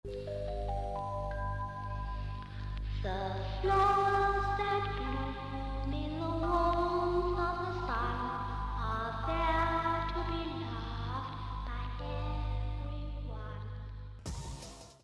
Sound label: escape failed; zombies